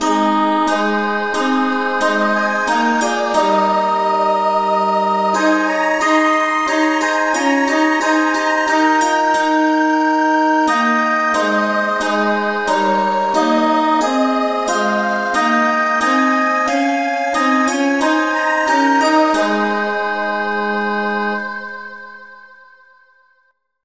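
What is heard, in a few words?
choir key A flat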